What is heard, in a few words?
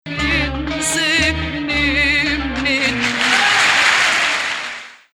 Rast, maqam